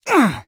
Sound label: Male Fight Damage; Mighty Party; Android